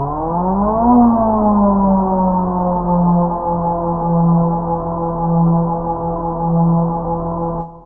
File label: sickly analog warning siren; Alarm Sounds